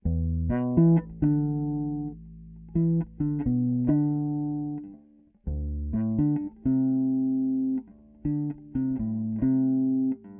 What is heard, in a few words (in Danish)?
Bas Riff